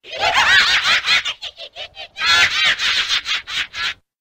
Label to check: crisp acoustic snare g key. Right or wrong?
Wrong